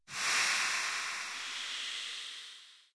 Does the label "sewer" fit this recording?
Yes